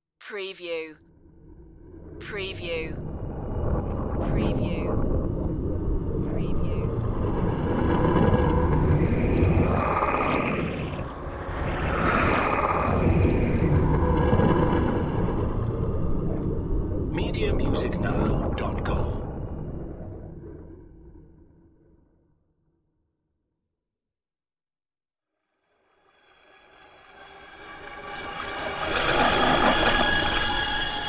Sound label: time reverse swooshes